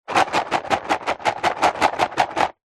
leisure dominos wooden in card box shake multiple fast